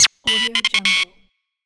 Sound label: dj scratch quick transform transition